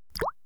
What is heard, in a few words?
DROP